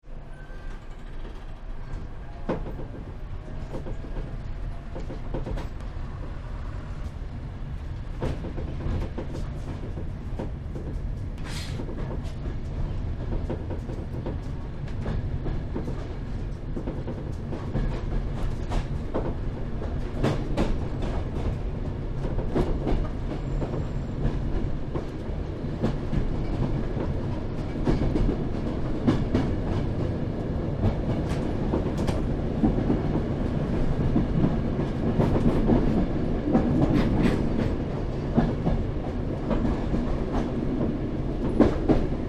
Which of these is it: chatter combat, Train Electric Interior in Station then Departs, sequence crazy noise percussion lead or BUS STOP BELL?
Train Electric Interior in Station then Departs